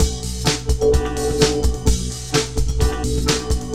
GALACTIC KIT, PROCESSED ACOUSTIC DRUM KIT; Sonic Foundry (Sony Creative Software); Vir Unis Infusion

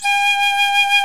PICL; Roland - LA Composer vol. 1; WOODWINDS

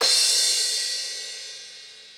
Kit, breakcore Drum Hits

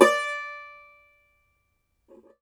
Cumbus Saz; Plucked Strings; E-MU Producer Series Vol. 7 – Old World Instruments

